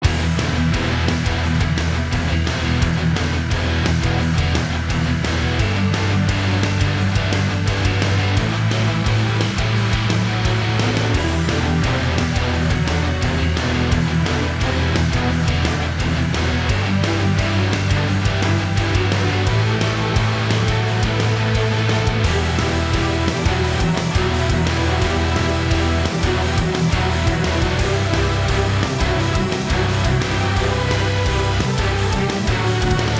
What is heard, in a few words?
Orchestral Rock; Orchestral Rock Vol 2; Just in Time